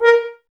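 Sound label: Brass French Horns A#; Sample CDs; Miroslav Vitous; Woodwind Brass Ensemble; F.Horns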